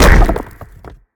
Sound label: hit; smite; geb